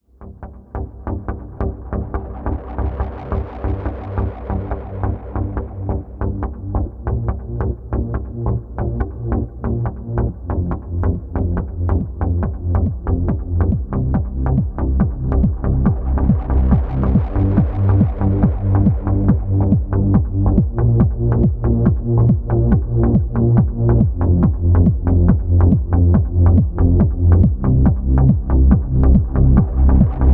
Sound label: Electro Trance